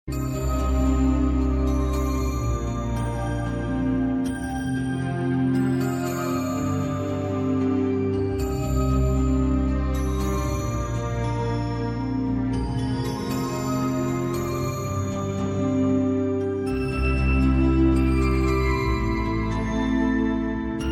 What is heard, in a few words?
Irish Lullaby